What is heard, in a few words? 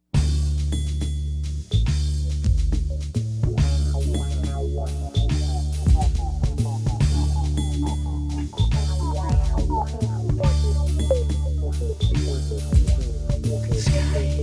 Soundcheck